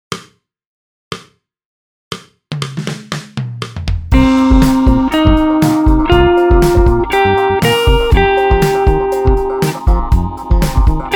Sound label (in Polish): impro gitara